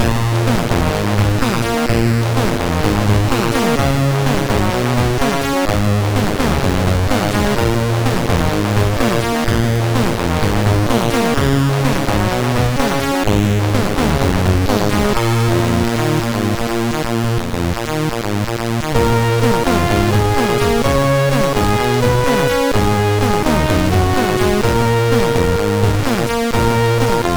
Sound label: Heavy Guitar, MOS6581R3